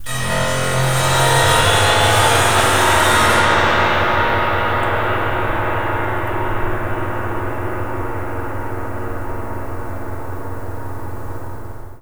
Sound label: GLISS L, Piano; InVision Interactive; The Piano